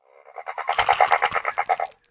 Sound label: dimo mumble